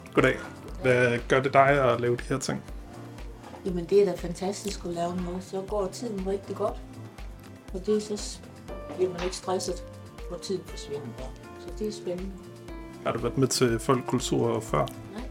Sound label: music